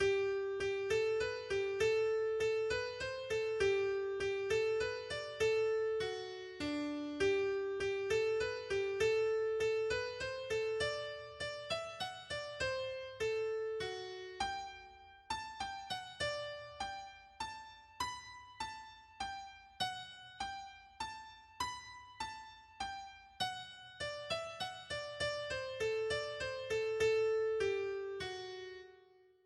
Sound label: Spanish Cloak Tune